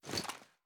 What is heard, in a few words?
sm ads up; gmod